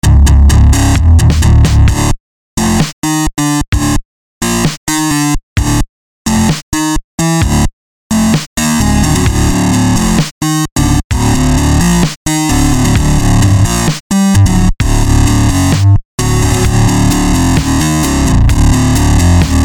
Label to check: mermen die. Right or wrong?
Wrong